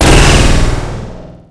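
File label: railgun fire; quake2; coop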